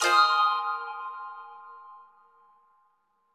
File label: click; chibi robo photo finder; click liek sound